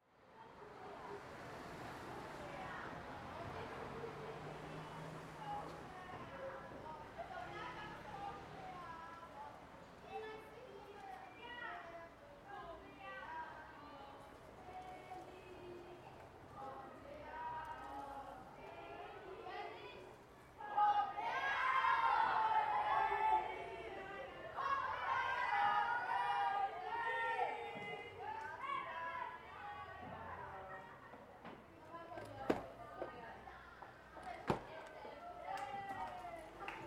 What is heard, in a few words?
school class long